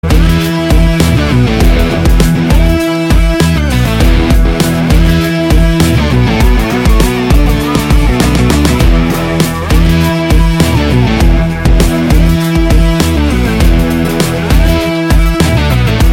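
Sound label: background music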